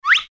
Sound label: jump; cutiepugs